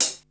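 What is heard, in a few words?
hi-hat mono closed; 300 Drum Machines; Yamaha Motif ES-Series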